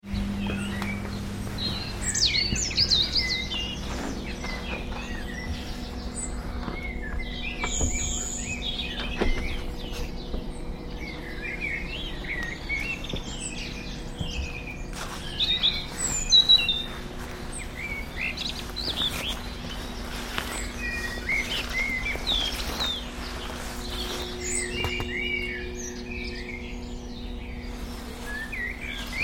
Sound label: birds singing in early morning